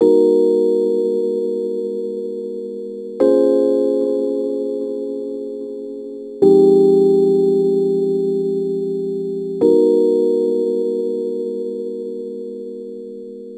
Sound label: Rhodes Attacks; Ueberschall